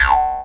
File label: C# JAW, Primitive Instruments